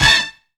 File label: CRANKIN, FUNKY SYNTHS; Zero-G; Funky Elements